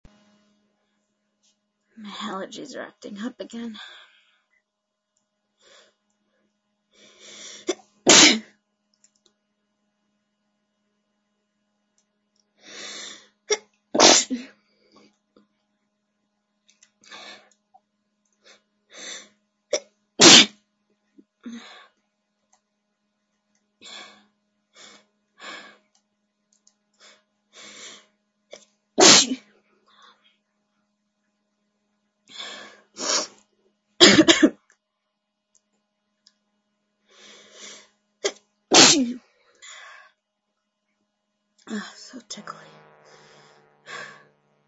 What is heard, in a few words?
allergies acting up, female